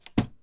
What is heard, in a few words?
Throwing; Phasmophobia